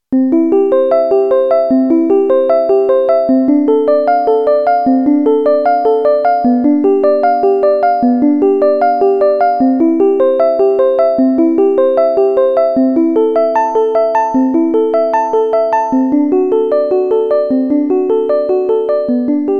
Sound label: bach fender